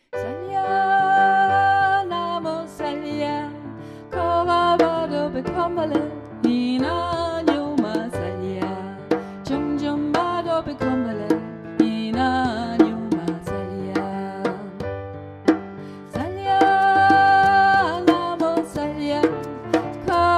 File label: salya, drums